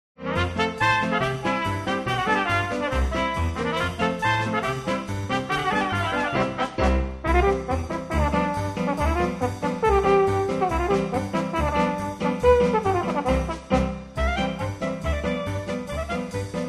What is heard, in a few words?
Krakowiak; Living Stereo